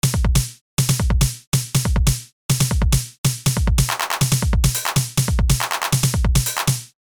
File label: drumz loop